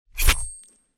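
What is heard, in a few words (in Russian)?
резко вынули нож кровь; Звук ножа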